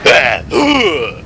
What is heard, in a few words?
HA-HUAAH